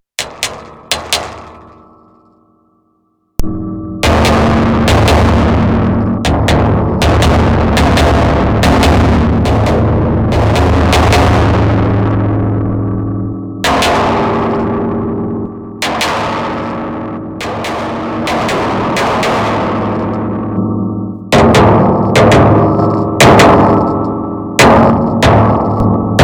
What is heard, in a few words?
metal